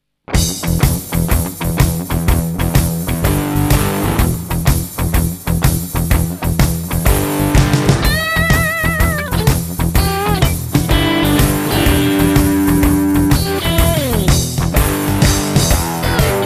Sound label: Blues